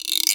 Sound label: Guiro Long